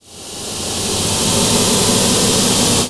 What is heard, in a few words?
flame; freedoom; catoptromancy